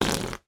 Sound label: cartoon whoopee cushion air release fart